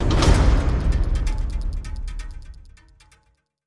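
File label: sting, big drum high percussion; transformers war for cybertron gamerip; ASSAULT TRYP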